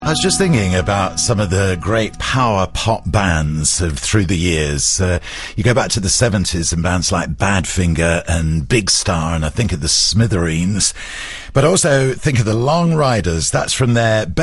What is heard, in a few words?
outro rap